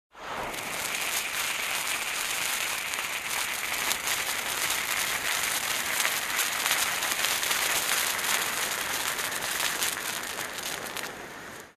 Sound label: Wick is burning; explosions